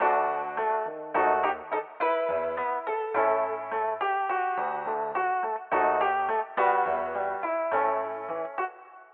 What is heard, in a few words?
Cmaj 105BPM Keys; 99Sounds; Monosounds; Music Loops; PANDORA BOX!